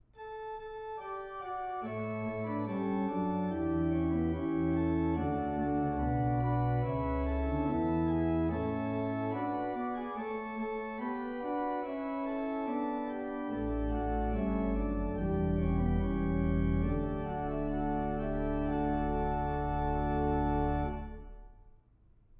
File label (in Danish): forspil